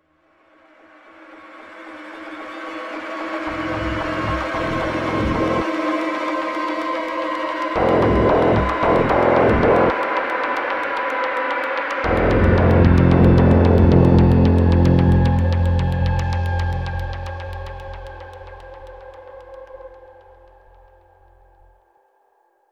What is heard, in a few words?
Suspense